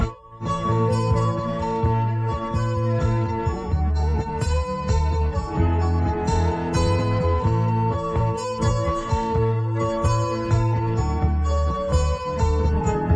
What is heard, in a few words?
guitar